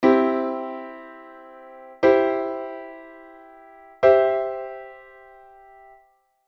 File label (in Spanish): Acorde Do, guitar